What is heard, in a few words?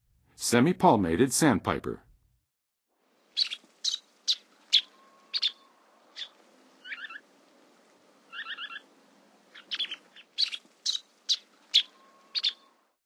Semipalmated Sandpiper, Songs of Birds of North America; Western USA; Western USA Songbirds